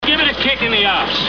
KICK

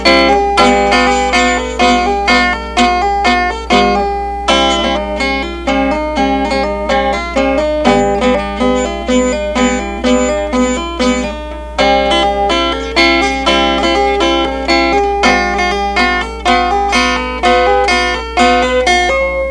polka

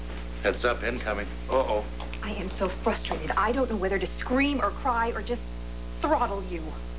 throttle

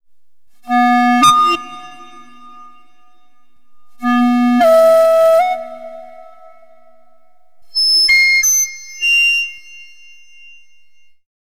high harsh reed wet; cyberwhistle

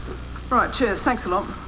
right cheers

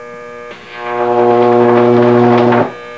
pig arc; inside our small 12x12 workshop

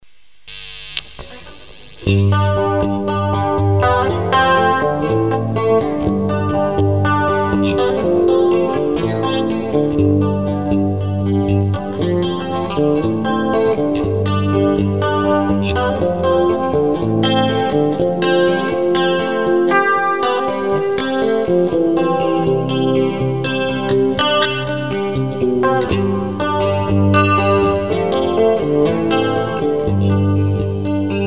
riff

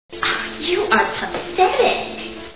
scream

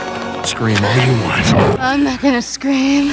Scream

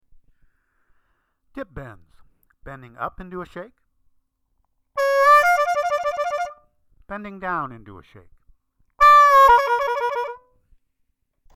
Dip Bends

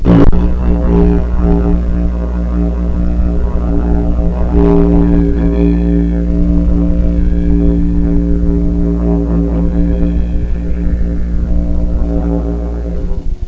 didge

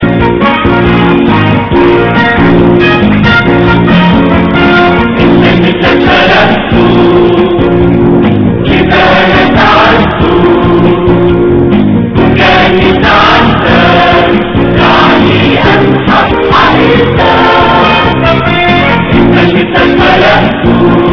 music

